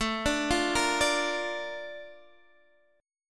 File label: Bb Major A, chord; Bb/A; arpeggiated